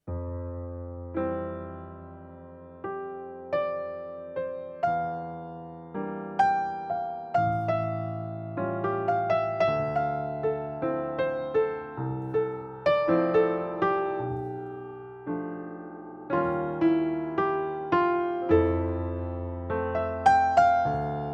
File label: vivo upright; dexibell vivo h1